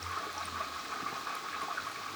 Waterway A Light

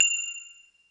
hi triang, Kurzweil K2000

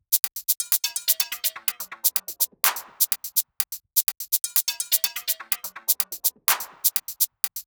125BPM TOP LOOP

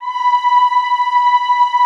B4, Classical Choir; Best Service; Extended Classical Choir

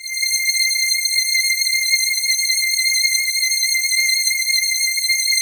HIGH STR, House Dance

